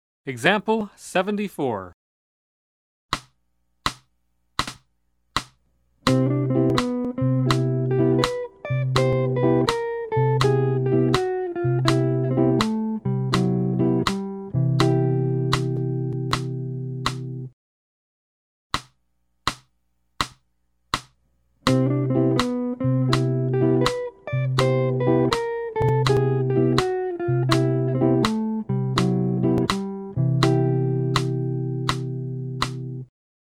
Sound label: Jazz; jue shi ji ta